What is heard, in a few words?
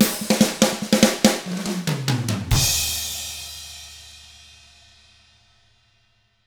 rock 6/8, drums; ILIO; Double Platinum Drums 1